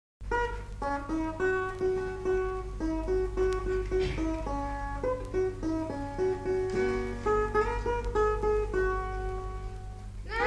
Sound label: Choir